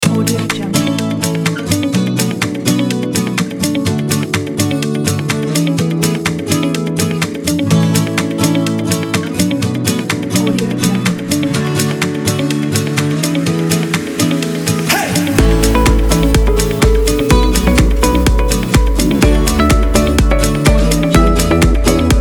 motivation uplifting kit

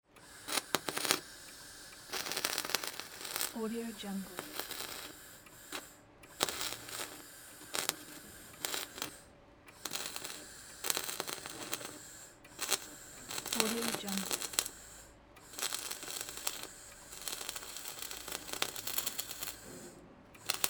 ship outdoor welding

